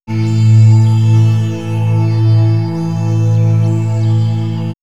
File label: Swirls Underground, pads; X-Static Goldmine